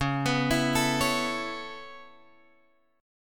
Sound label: C sharp Minor 7th Sharp 5th C sharp Guitar Drop D